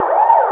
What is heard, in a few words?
cop car